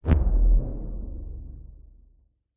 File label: cinematic hit impact lfe boom; sound design